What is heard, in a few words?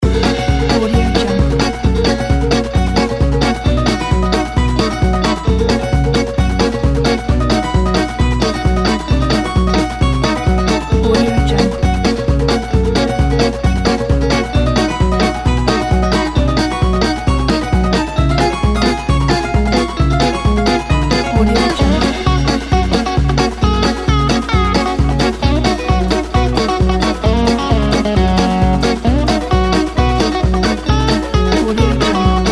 country happy comedy